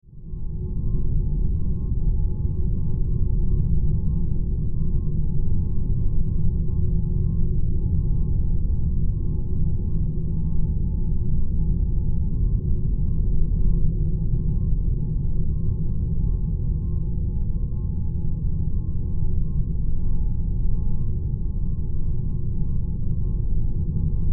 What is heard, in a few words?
Science Fiction Drone Ethereal